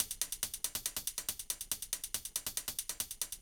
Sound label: Beat Hats, 140bpm, Grime; Zero-G Sound Sense Grime Scene; Construction Kits; Astoria